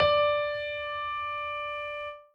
piano melo

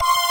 synth

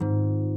harp

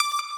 pluck

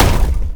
fx cinema

